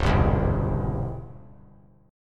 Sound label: C sharp Minor Major 7th Flat 5th; strummed; 1,2,3,2; 3C,3F,3A,3D